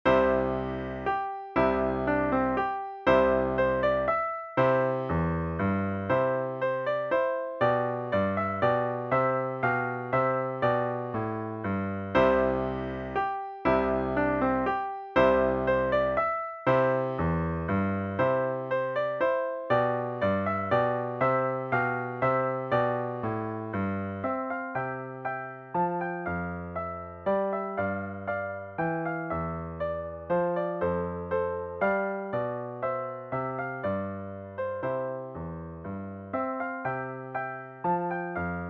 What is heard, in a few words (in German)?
Menuet, Klavier; Wasser